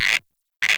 Close River Frog; Ambience; Frogs

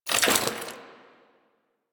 generic foley; Warframe; Warframes; Dagath

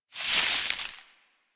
Hoverboard Terrain Caster Snow; Warframe; DE; Vehicles; Venus

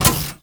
Flamethrower Reload

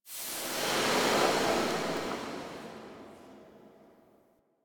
Lotus Hand Projectile Smoke; Warframe; Weapons; Duviri

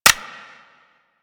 Dex Furis Mech C; Tenno

